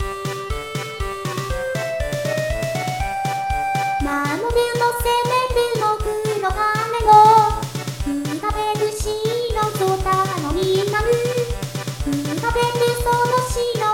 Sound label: battleship march, vocal